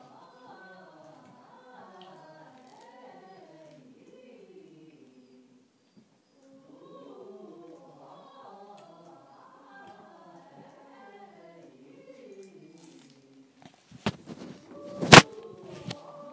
in a dark basement and noises are coming through the ceiling